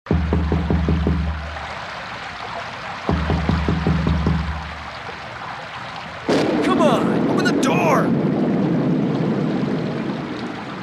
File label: Open the door